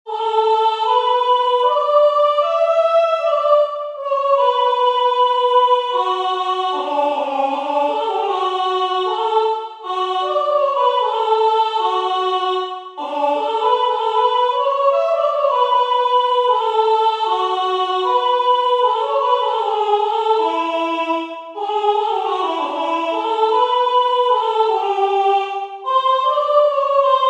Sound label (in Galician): entonacio piano voz; Entoación con acompañamento